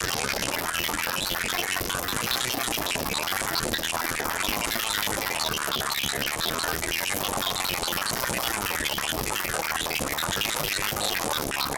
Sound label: R'n'B Snaps